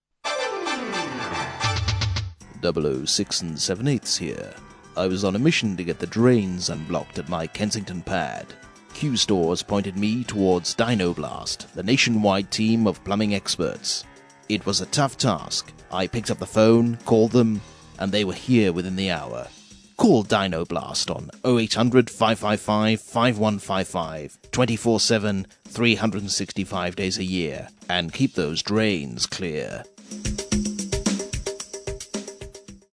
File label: dyno music; Dyno-Blast